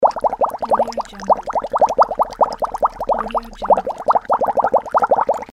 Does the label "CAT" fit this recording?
No